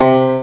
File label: PICKED S, STRINGS; Easy Personal Web Page